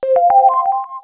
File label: alarm; fldigi; alert clips